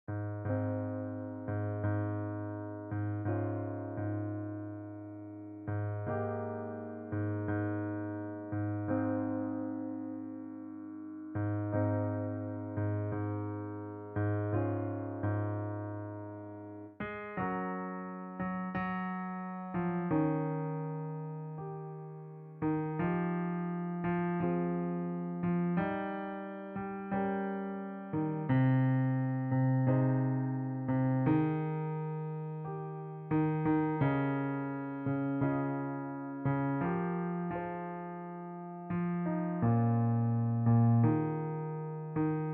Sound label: Bass line; salvationarmy; Audition